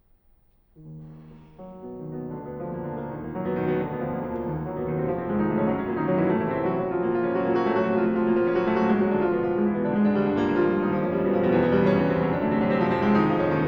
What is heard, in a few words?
Preludes, In E Flat Minor, piano; International Fryderik Chopin Piano Competition